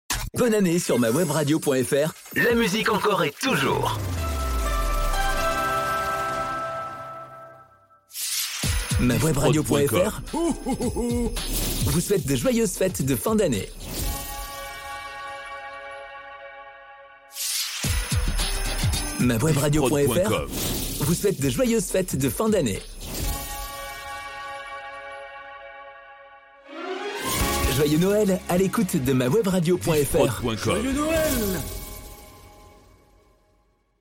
Webradio Jingles Noel Fetes

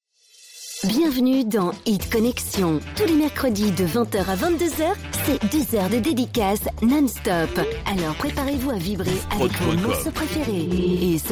Jingle Intro Dedicaces; Hit Sensation 85

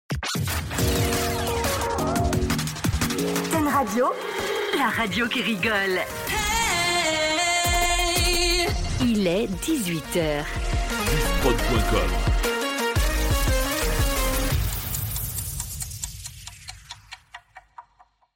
Radio Top H 18h Sans Cage

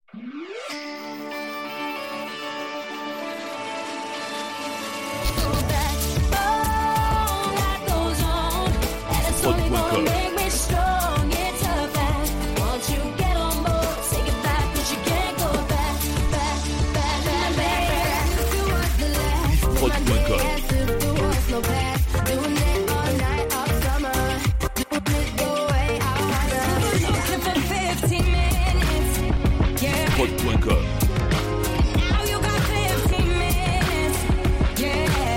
Medley Pop Anglosaxone

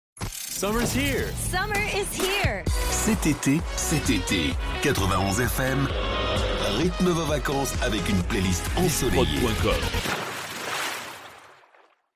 Jingle Ete

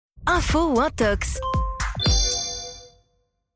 Sweep Info ou intox; Le Recap Tele Realite

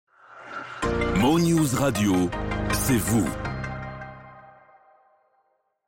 Sweep Cest vous; Mo News

